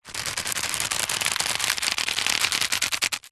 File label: rope thick old tension movement creak stress; foley